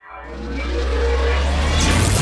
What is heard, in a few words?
Beam Laser Charge; Warzone